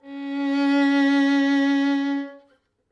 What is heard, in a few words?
Violin C 5 Tenuto Vibrato